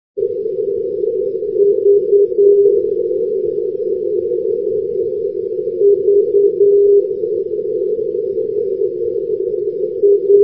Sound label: ndb kruunupyy; v-339; maakeskidx; europe